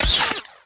saber hit body

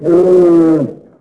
Call, Dino; Allo

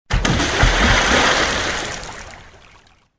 ore splash

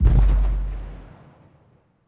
distant explo

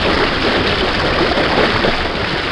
roll water, terrains; Tony Hawk's Project 8; XBOX

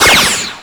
Acquire Power Up; Vehicles

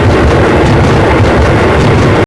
washing machine running; Max Payne

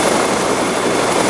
huey tail; ambience; Soldier Of Fortune II Double Helix; XBOX

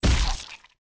head explode, bullet impacts gore; Soldier Of Fortune II Double Helix; XBOX

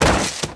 bodyfall stone, foley

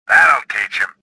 victory; swamp2; Star Wars Jedi Knight II Jedi Outcast; XBOX

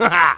huh ha; Splashdown; XBOX

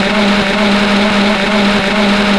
grind rope, terrains; Tony Hawk's Project 8; XBOX

